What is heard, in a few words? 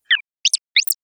Beeps short series